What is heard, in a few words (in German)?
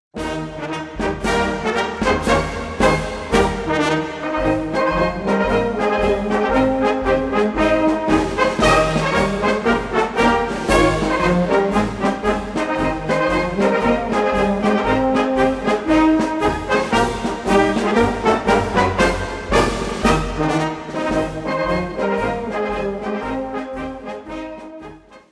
Marsch